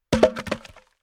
Domestic Throwing Large Log Onto Log Pile Wood Impact And Roll; Miscellaneous Impact